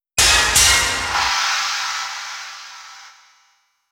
Challenger Appears; Super Smash Bros. 64

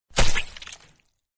splat sound; Arc of Templar

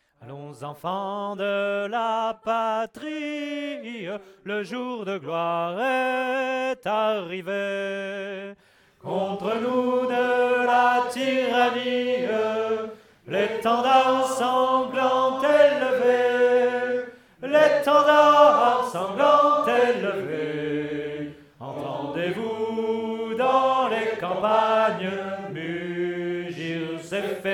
Choir; assassin s creed unity gamerip 2014

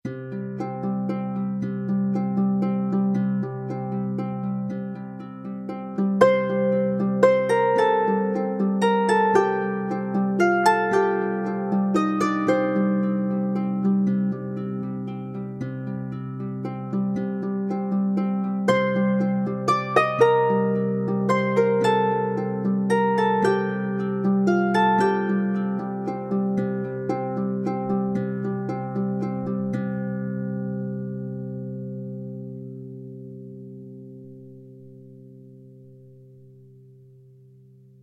Grandma Story without narration; children of morta; Procedural Events

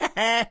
Victim Miss Male; friday the 13th killer puzzle

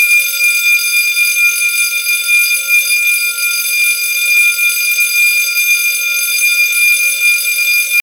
Domestic Alarm

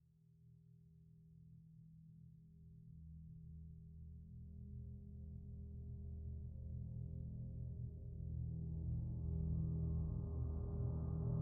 Dark Ambient

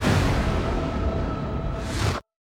Sfx Level Up; runic rampage

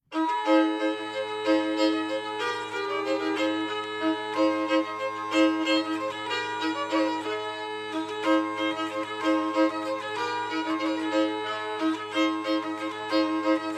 Tavern; assassin s creed unity gamerip 2014

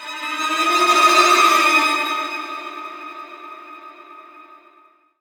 Stinger End, gamerip; dying light; Slums Storyline Miss Demolition

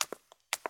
Steps Wet Road

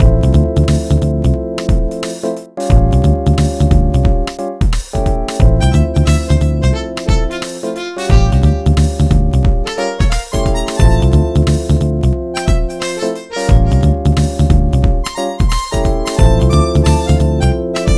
Gameover; Midnight Play Pack

